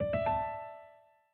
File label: Notification